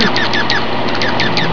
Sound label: junco; scbirds